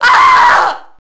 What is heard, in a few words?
female death; enemy; Max Payne